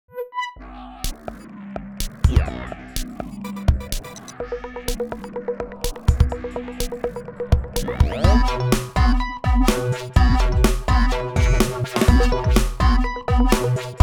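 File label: Tweaker ringtone; PlusFourSix; SE Sound Project; Paul Bergman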